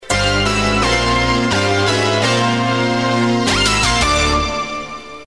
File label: out sting (doh ray me); bailrigg fm; bfm jingles ads RSL 1994